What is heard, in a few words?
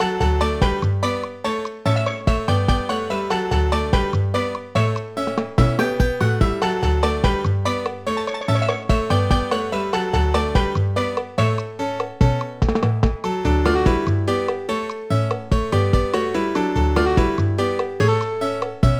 Music City